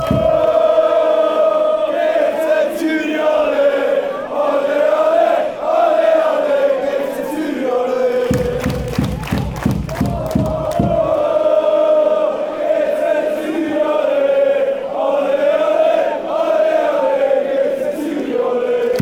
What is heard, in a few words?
allez allez oh, fan chants